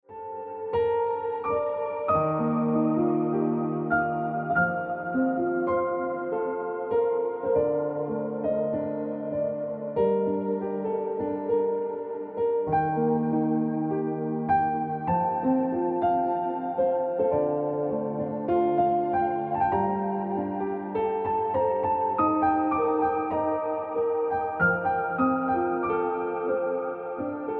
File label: Ringtone Piano Music